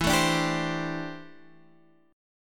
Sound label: D 7th Suspended 2nd Suspended 4th E